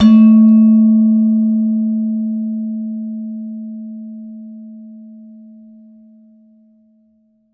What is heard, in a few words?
Gender, Gamelan